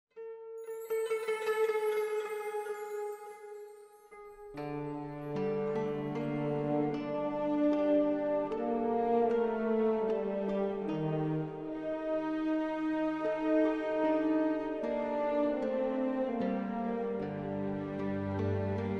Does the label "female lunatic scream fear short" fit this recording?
No